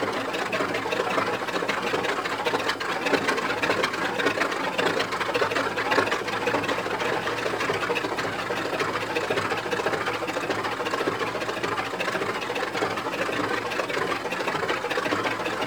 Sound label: Crew Ship Engine Idle Loop; Warframe; RailJack; Grineer Crew Ship